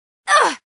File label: "Ow"